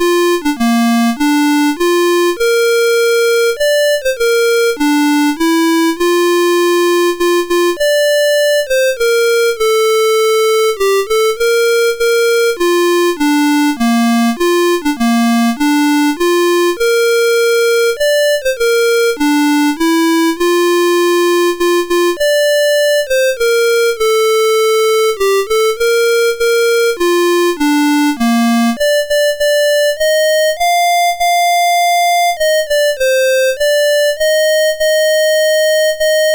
Star Spangled Banner, MOS6581R3

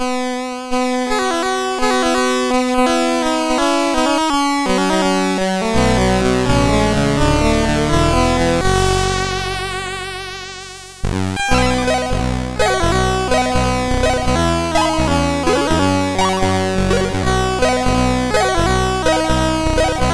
sid MOS6581R4

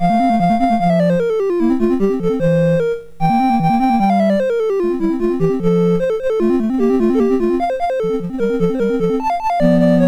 Sonatina in F, MOS6581R2

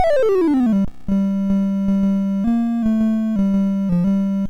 sid MOS6581R2

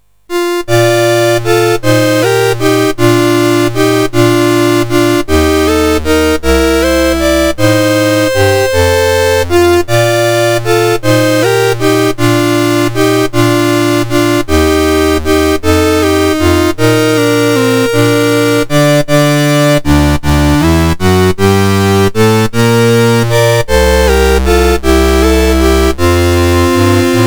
It came upon the Midnight Clear, MOS6581R2; Bob Huffman

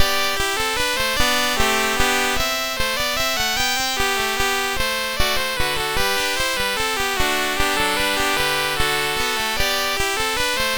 Minuet in G, MOS6581R4

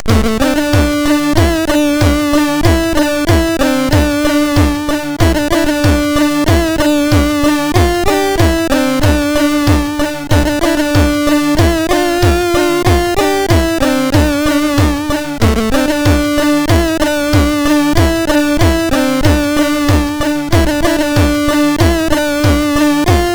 sid MOS6581R2; Blue

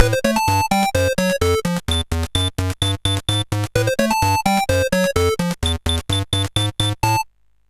sid, CSG8580R5; Coco-Notes

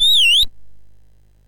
sid MOS6581R2; California Games

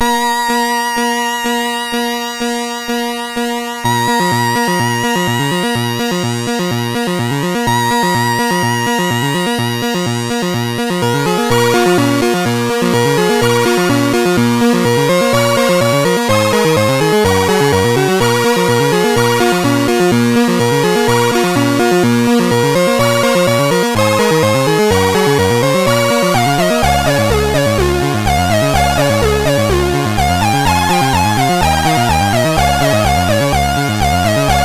MOS6581R4

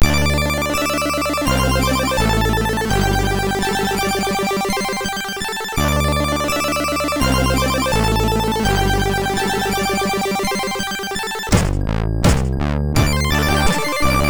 Beat Session music; MOS6581R2

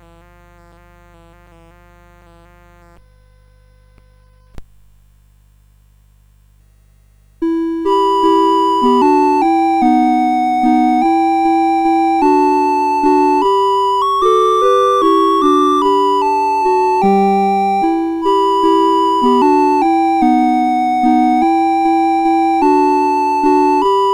CSG8580; CSG8580R5

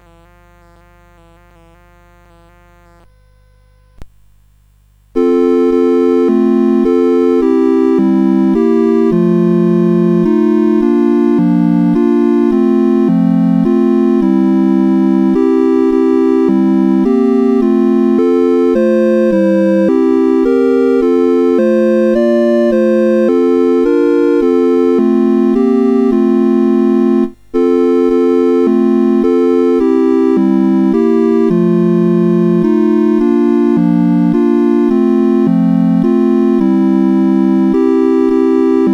CSG8580; CSG8580R5

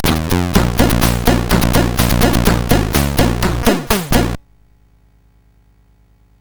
Game Music, sid CSG8580R5

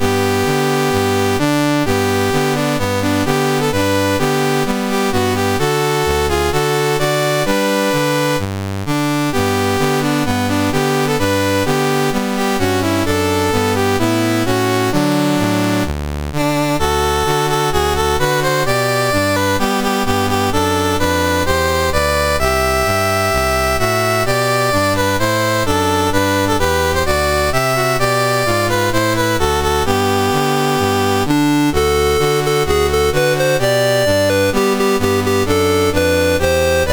Albania, National Anthems, MOS6581R3

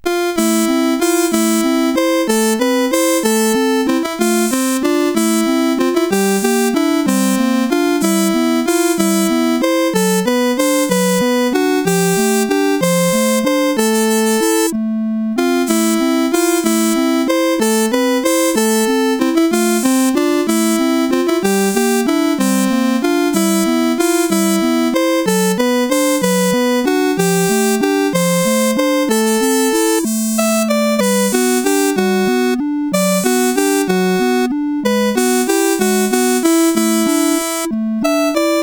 music box, CSG8580; CSG8580R5; hvsc; Eight C64 Games in Six Minutes

